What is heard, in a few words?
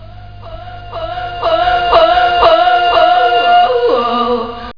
banshee